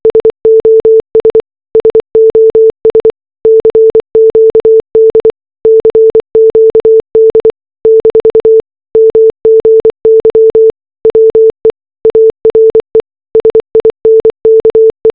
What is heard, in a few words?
distress signal simulated as morse code; RMS Titanic